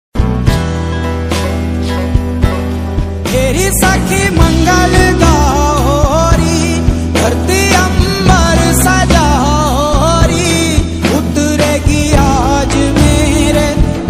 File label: gujarati ringtone